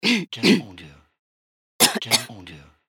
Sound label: Coughing Woman